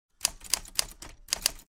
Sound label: Typewriter vintage typing short